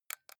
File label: console controller xbox joystick press